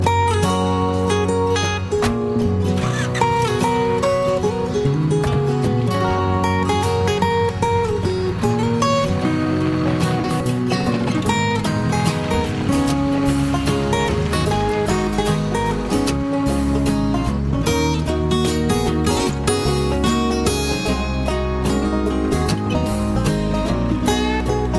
guitar theme; ringtone; scrubs

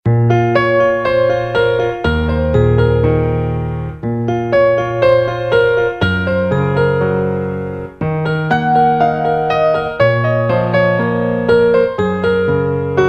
classic piano

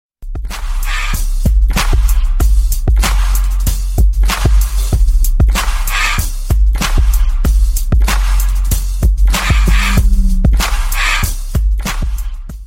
beat with vocals

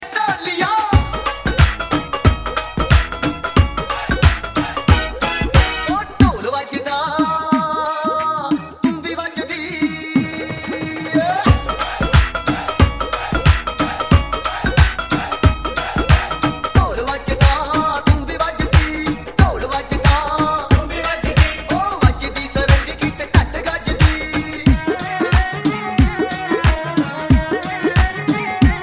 punjabi music

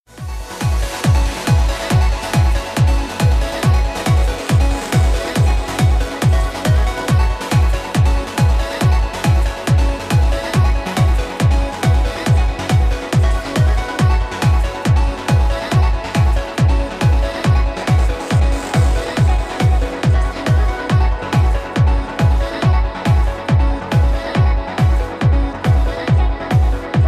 trance

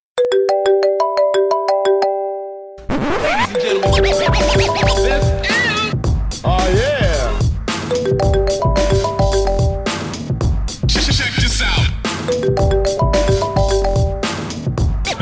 hip hop call

